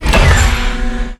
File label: Npc Crane Machinery Die; FlyForInsanity